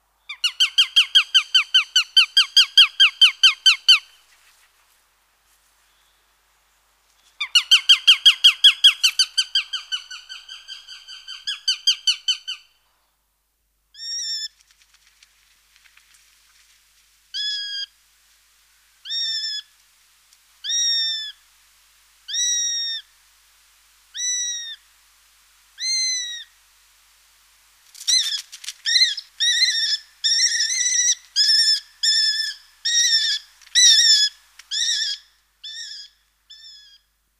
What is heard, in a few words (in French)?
Epervier europe